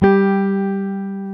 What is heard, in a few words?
Jazz Guitar